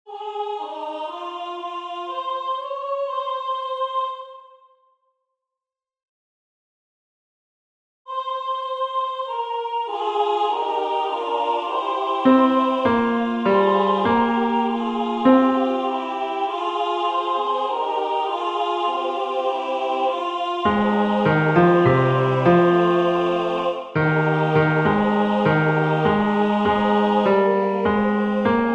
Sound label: Ave Maria Bass; Victoria